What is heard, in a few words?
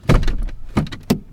Gear Shifting